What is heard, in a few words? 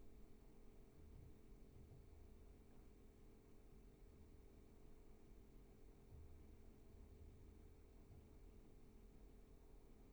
roomtone